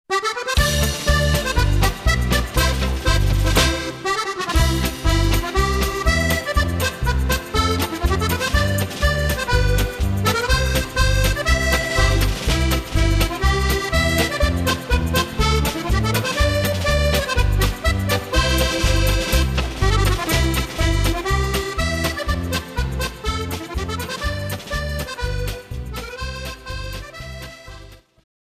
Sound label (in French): accordeon; c'est magique l'accordeon